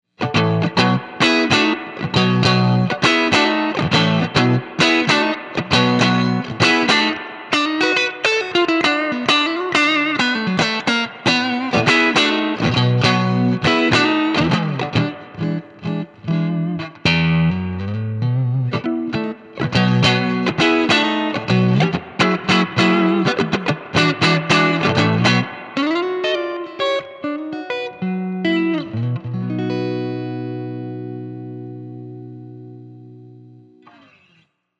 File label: 6V6 Blues in B